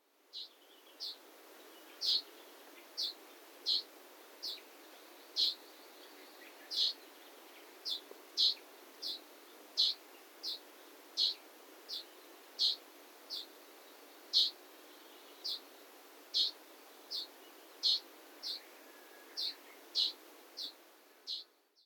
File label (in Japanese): メボソムシクイ, 栂池自然園